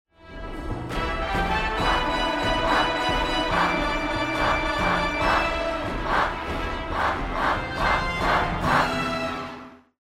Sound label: bomb ten sec count; music kits custom